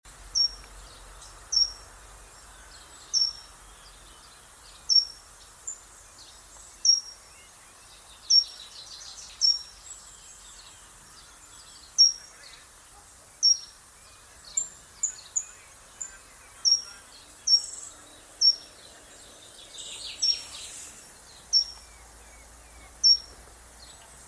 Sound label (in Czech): šoupálek krátkoprstý Certhia brachydactyla